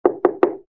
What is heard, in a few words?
knock